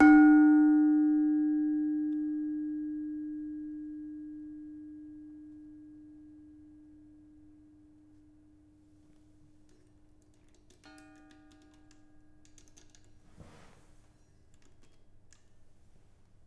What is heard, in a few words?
Demung hard, gamelan